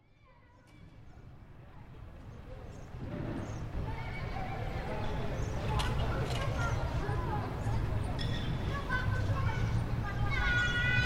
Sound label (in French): ambiance; coeur de maille rénovée